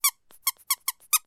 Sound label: toy squeaky squeaks